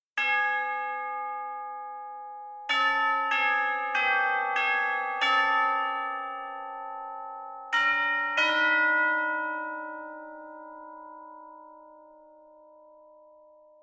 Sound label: Christmas Music; Tera